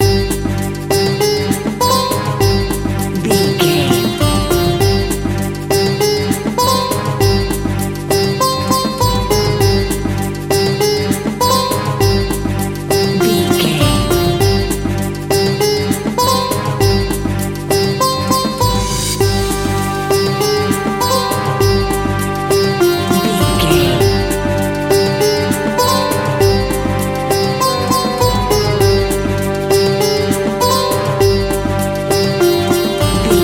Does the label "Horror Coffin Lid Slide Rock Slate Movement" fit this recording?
No